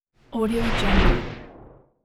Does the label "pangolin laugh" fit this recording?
No